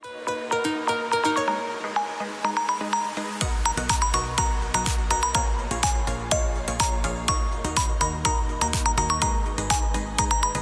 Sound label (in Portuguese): House Musica Eletronica; Curso Masterizacao para Musica Eletronica